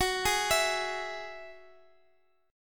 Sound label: F sharp 7th Suspended 2nd, down, Guitar, Open D